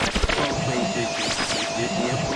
radio noise

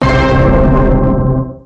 orchestra hit; Punches Hits Discoblasts

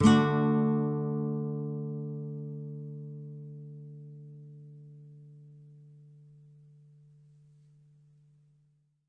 Nylon Chord D maj; Nylon Guitar Chords

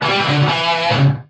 straight up guitar lick

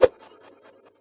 kung fu staff, swings

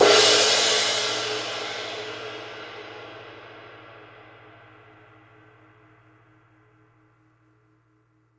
big ride crash; Big Believable Rides

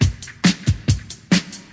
straightup, drum loops and breaks

